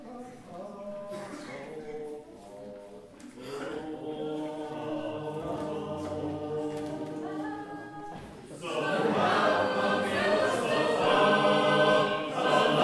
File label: Sacred Harp; Singing Pilgrim